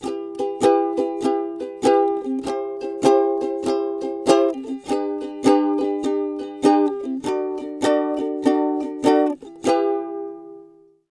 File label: Ukulele Em C G D 100bpm